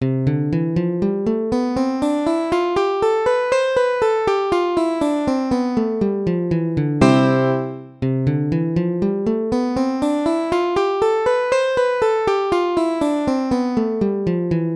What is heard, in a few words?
C maj scales